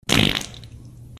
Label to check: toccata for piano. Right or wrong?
Wrong